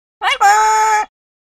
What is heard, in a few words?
Macaw Vocalizations